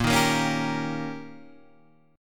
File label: D sharp 7th A sharp, guitar